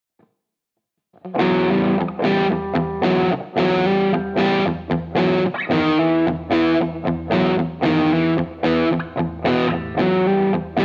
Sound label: riff